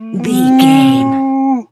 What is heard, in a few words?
cow moo